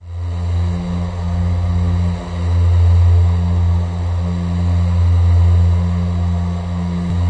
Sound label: ahhh F2, male singing